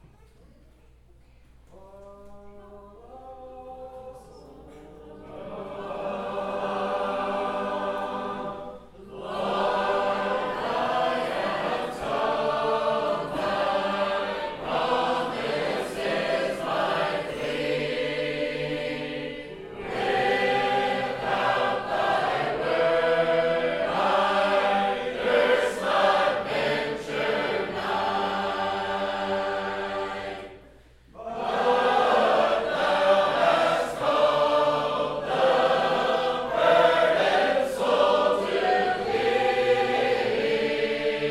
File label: Sacred Harp